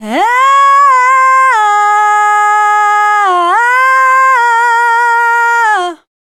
Dance Vocals, FEMALE; Best Service ProSamples vol.12; AKAI

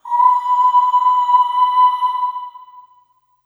Ooh Short B4 Short Reverb; Harmonic Building Blocks

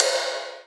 Ride, Rides; Electronisounds

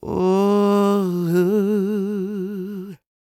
CROON, Soul

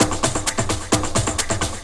percussion, 130, drum loops; Ueberschall; Techno Trance Essentials; TRIBAL

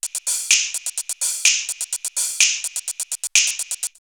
Finger Snap! 126, drumloops, Rave; X-Static Goldmine; 90 s Sample CDs

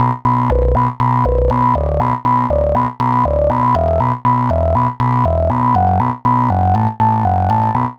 Frog Bass, Bass Foundations; Sonic Foundry (Sony Creative Software); The Electronic Music Manuscript Collection

